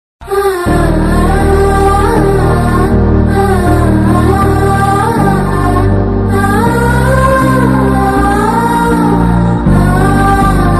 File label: movie bgm ringtone love bgm ringtones Telugu bgm ringtones south Indian bgm; badrinath movie